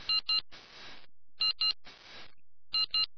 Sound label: Low Battery; TCL A996